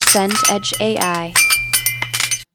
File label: footsteps run concrete